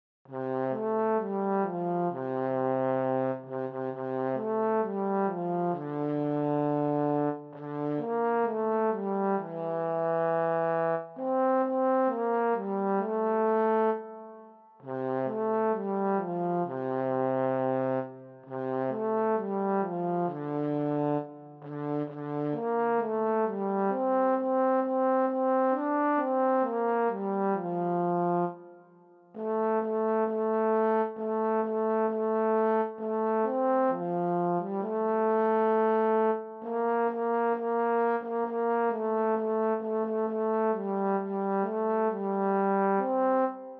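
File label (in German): solo Jingle Bells Posaune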